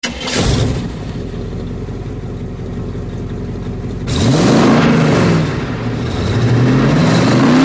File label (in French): Dart 340, bruit V8 V10 dodge